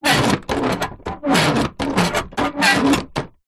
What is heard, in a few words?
vehicles truck rear door metal latch lock turn grind